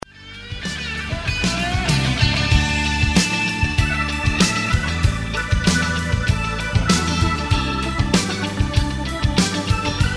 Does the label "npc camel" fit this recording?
No